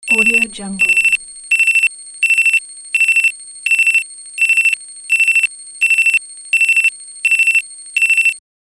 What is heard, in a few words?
digital data algorithm; soundmasterfx